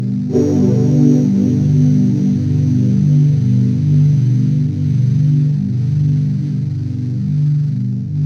Locust Pad; jup8; Aphelion

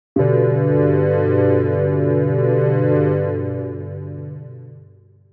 Warped Organ, jup8

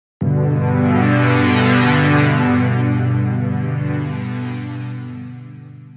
Warm Pad; jup8

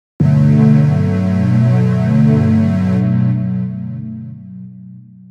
Warm Horizon; jup8

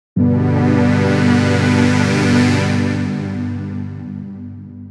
Warm Saws, jup8

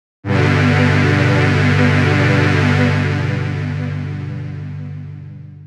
Synthwave PAD IN Time; jup8

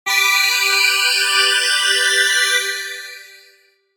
Analog Yearning Strings; jup8

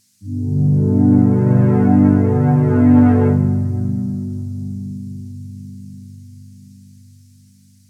Heavey Pad; j106

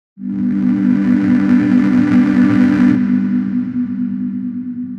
Epic Dirty Record, jup8

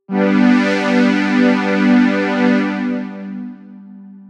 Warmer Pad; j106

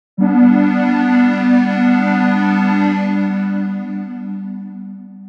Synthwave Squarepad, jup8

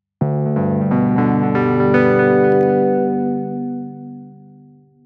Synthwave WARM PLUCK, jup8

